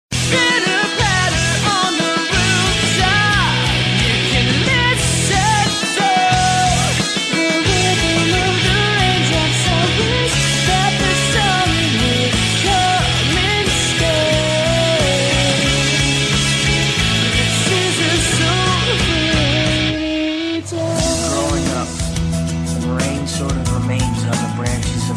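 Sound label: cinematic surprise ringtone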